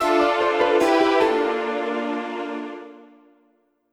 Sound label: Harp - Aubade; Brandish 4; SC-88Pro